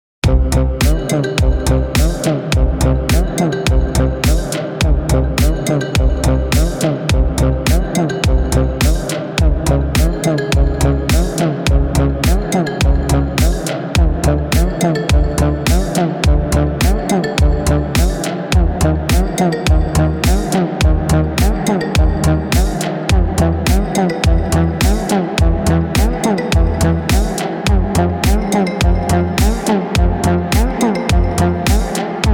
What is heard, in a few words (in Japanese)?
ぐぐぐ